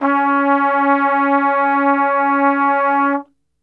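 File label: C#2, Flugelhorn, Brass; Best Service; Brass Super Section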